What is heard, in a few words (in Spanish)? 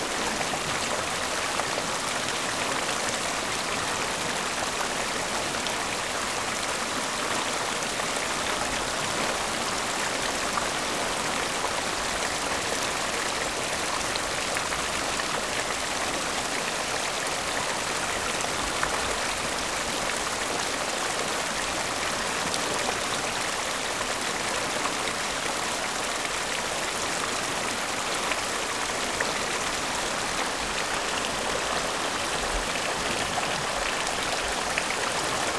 arroyo cascada agua